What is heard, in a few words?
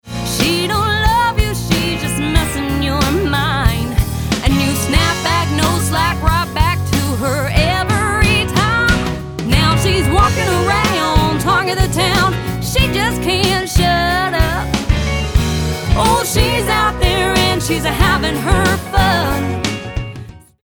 POP COUNTRY SAMPLE